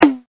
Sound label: TOM HI